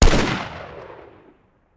Rifle; Sound Ideas; Guns

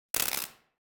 Electric Static Sparks; Hollywood Edge; sound designer's toolkit v2

